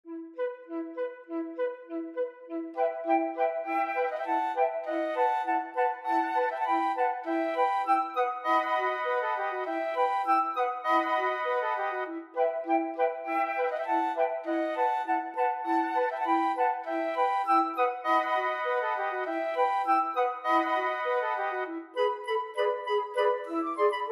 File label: flute quartet